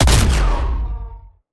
sndshotgun

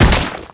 imp ore stone

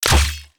imp spear

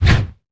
p atk riotshield

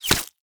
bullet through

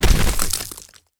imp stone brk

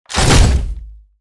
atk big mecha

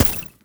atk pen arrow

